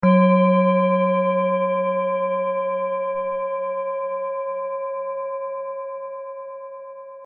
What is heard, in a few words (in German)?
Antike Planetenschale; Biorhythmus Geist, Ø 13,7 cm; Sound-Spirit